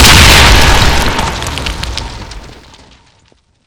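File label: claymore explosion, sound/weapons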